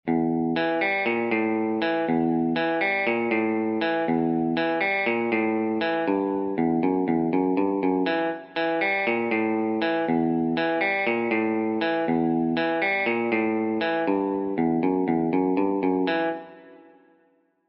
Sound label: Riff